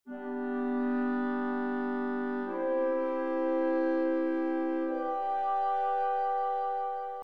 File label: chords; Nikolai Rimsky Korsakov